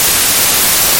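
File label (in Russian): korotkii shum pomekh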